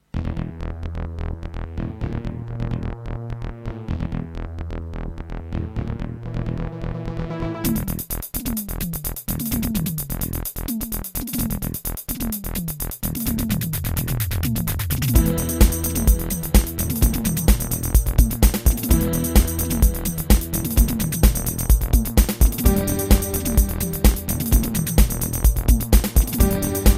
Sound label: Terratec MiniWaveSystem SOWT-11; Descent - Intro